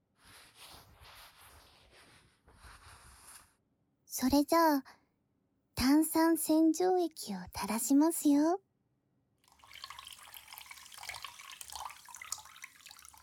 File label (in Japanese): 炭酸洗浄液, 炭酸音 左耳; わたし特製の、炭酸洗浄液ですよ♪; 彼女は僕の耳を……したい。～キレイ好きすぎる清純JKが、お耳を徹底的に『洗浄』します